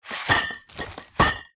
bottles beer carton celephane wrapped movement; foley

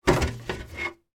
stick insect plastic tank lid remove; sound effects one

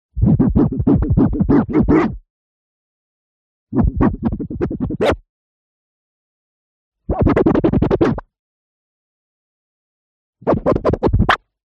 tape recorder reel clean scratch short

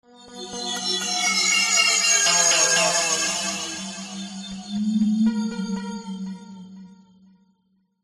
sound design mysterious slow swell sweep fm; zapsplat

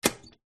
household rice steamer switch

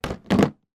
toy duplo large box down on surface

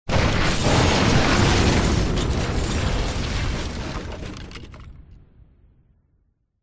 huge explosion; zapsplat

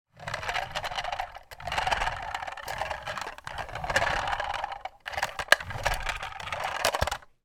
toy truck car roll across carpet floor; sound effects one

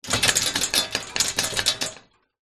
household mirror floor standing shake wobble

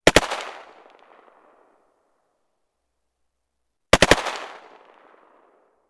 gun ak 47; still north media

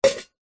household teapot old metal set down stone surface; zapsplat

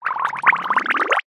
cartoon bubbles

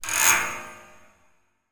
foley metal scrape coil spring industrial; zapsplat

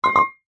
foley glass bottle down on concrete

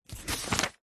book workbook page turn; household

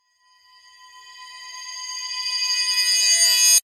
sound design riser metal high pitched reverse

